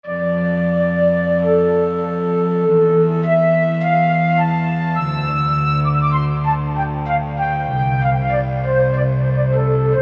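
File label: Cello Flute, Korg MSC-1S; Korg MSC-1S Variety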